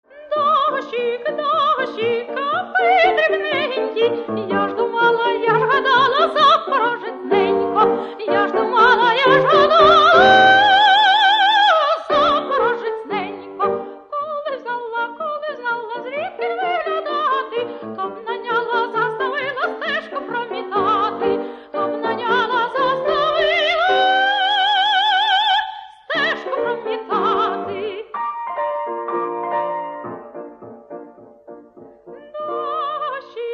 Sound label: vocal miniatures